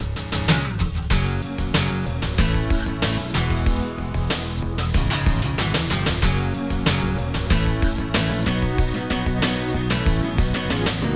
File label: guitar squeeze